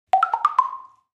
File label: Percus Plastic Block, percussion blocks